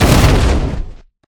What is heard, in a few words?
metal fall; Golden Crayon Sounds